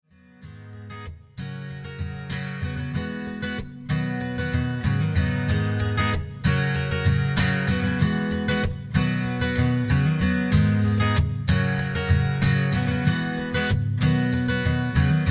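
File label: Cajon, B flat major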